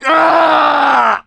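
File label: death scream, male; survivor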